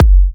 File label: F#, Kicks; markus hakala